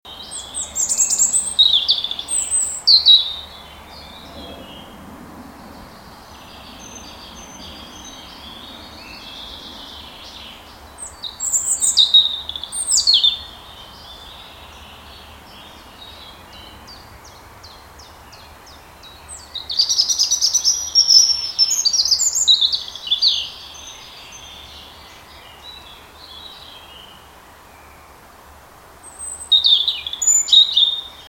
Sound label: erithacus rubecula; vogel; hecke; biologie